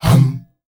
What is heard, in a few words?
hum hum, S. African world voices